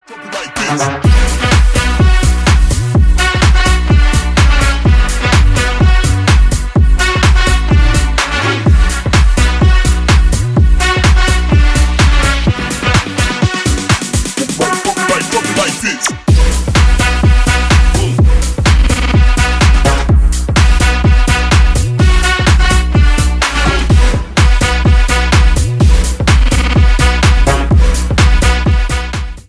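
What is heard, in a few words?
bounce, edm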